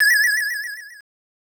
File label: Coin